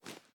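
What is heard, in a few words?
sniper reload cloth; gmod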